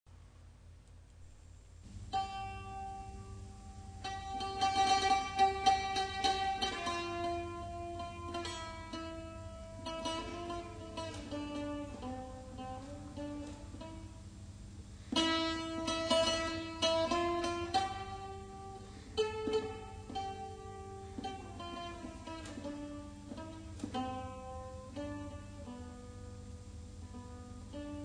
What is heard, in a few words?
guitmaur, instruments medievaux